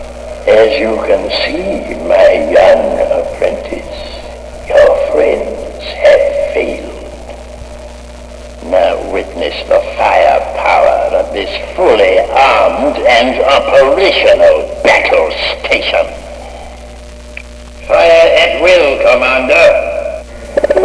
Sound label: deathstar